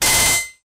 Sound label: sound of a kalashnikov ak, firearms